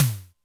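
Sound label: Snare Electric, Alesis D4 drum machine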